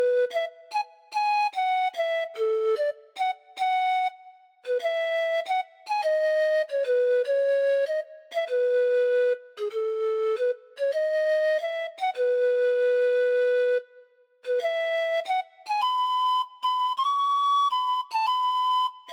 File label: Pan Flute; Roblox Islands